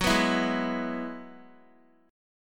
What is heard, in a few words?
Gb 7th Suspended 2nd Suspended 4th, Guitar; Gb7sus2sus4 strummed